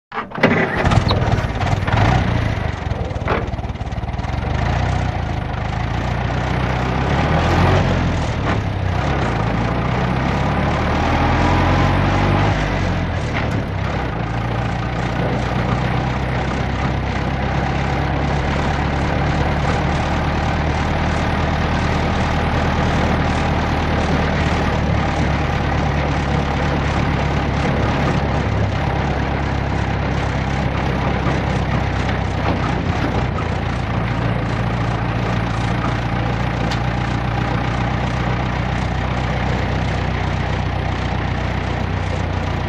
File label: Automobile; Interior; Chevrolet Interior Start Up And Away Through Gears, Roughish Road, Medium Speed, Finally Slows, Stop And Switch Off, antiques; 1927